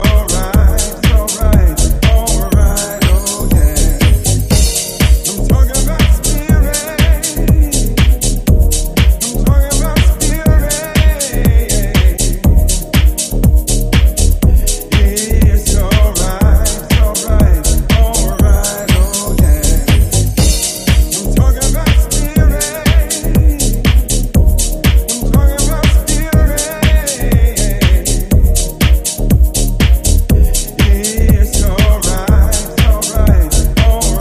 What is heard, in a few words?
Soul Strings Vocal Dub Remix